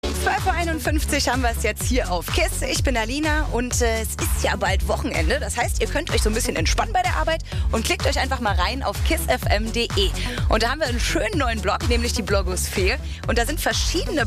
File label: radio jingle; kissfm